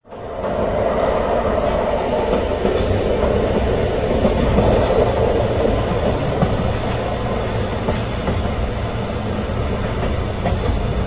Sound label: kiha, rail